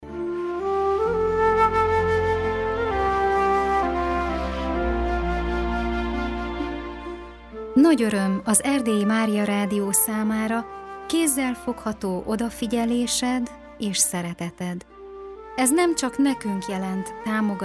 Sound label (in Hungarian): Koszono Jingle; Penzkero Szignalok